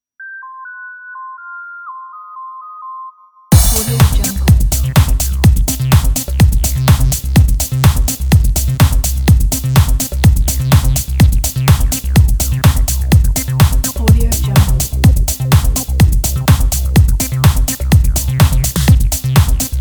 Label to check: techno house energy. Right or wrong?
Right